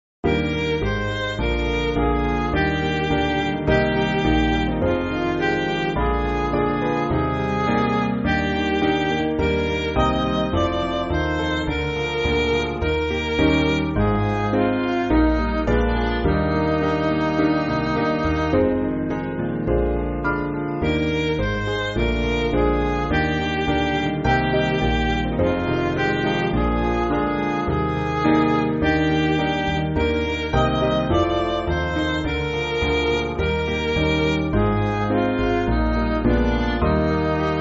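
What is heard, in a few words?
Midi, Backing Files for Churches; Enon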